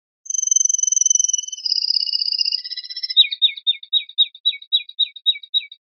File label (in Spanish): rep primaveras, pardillo